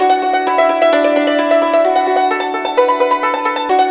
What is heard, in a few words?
YNCHIN 90 D; INIS - Opium